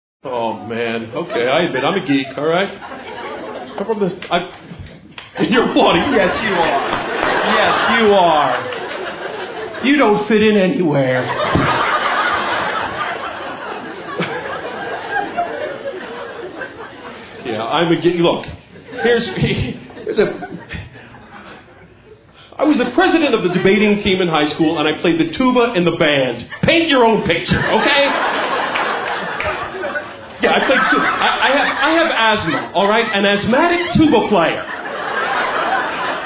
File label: Tuba